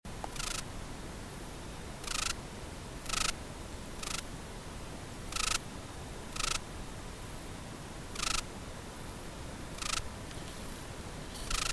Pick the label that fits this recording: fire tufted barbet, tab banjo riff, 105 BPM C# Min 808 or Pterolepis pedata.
Pterolepis pedata